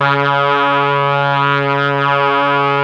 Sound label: BRASS; ILIO; Propeller Island Legendary M400